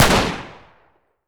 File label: g3 fire; g3a3; garrysmod